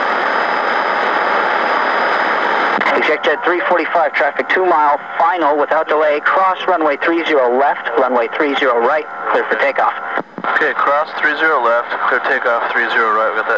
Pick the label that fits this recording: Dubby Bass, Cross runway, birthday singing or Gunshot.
Cross runway